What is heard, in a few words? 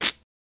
Toast Scratch Scratc